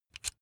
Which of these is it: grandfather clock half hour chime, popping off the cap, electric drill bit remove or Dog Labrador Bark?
electric drill bit remove